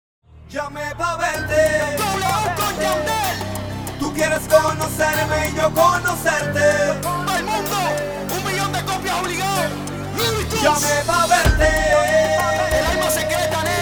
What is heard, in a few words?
Reggaeton Mix; Mobile DJ